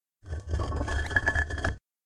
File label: Deep Rumble Walls; SyrinScape; Hunting horror battle